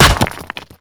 basicattack; LoL; twitch

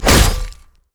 basic attack knee; rengar

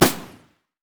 microrockets; LoL; heimerdinger

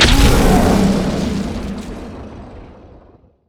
roar; majestroar; Volibear

